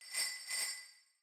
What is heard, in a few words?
tone; Christmas; seasonal